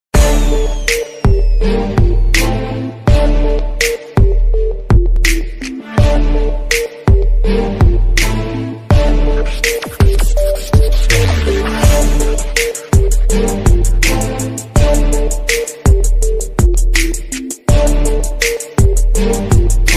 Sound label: bgm ringtone attitude ringtone